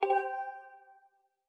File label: Text Next; Go to the next page in a text box; Skyward Sword; zelda